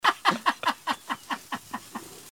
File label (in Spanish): risa